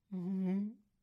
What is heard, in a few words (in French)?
chaise métal tirée; Mobilier